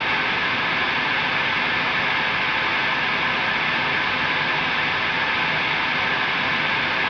left input nothing connected with effects, spluttery noise on audio interface inputs